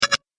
Robot Turn On Switch, sci-fi robot sound effect; Robot Sound Effects; Andrew Fleming

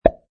Cartoon Pop, Cartoony pop sound; Cartoon Sound Design Sound Effects

